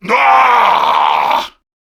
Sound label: Death, Male